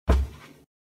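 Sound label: step, footstep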